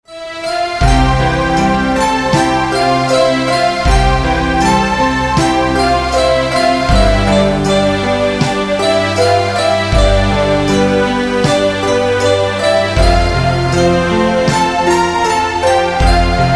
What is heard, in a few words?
Sky Atmosphere